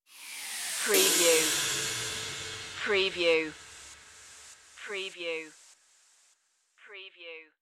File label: big softee stinger